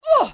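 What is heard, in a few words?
grunt, female, pain death; fmale; Soldier Of Fortune II Double Helix; XBOX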